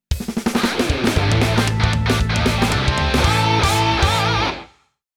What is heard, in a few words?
JINGLE BATTLE WIN; Beyblade X